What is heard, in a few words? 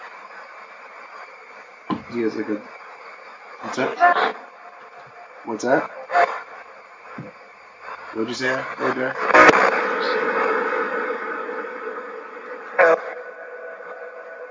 Library Spirit Box; wexford general store antique shop return investigation